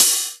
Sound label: Boss DR-202 hat